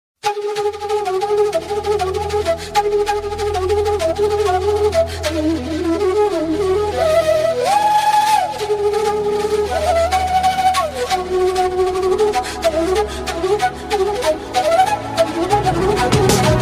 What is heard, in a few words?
flute ringtone